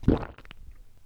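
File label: heavy swallow processed; Lewd SFX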